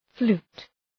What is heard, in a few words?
flute